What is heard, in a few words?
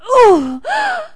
gasp